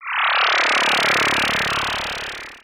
Science Fiction Sci-Fi Electronic Tractor Beam Emitting